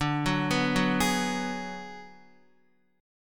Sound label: D 6th Flat 5th, Guitar; D6b5 arpeggiated; JGuitar